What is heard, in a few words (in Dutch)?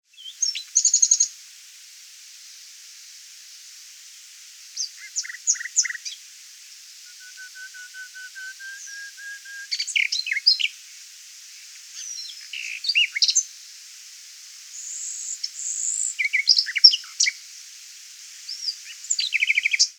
Nachtegaal